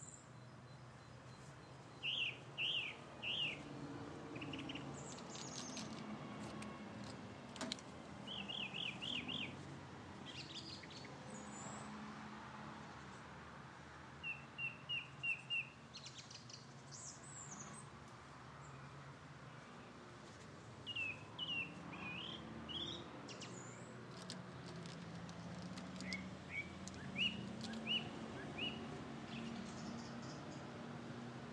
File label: morning chorus of one